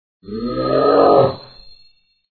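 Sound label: occultist attack voice middle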